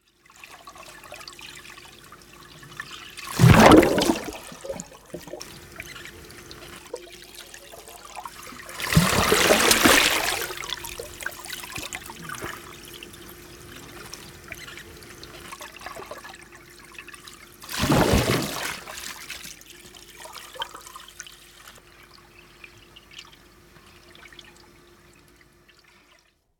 Sukellus Vedenalainen Diving Sounds In A Swimming Pool Underwater Recording